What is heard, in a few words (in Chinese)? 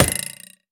三叉戟地面冲击声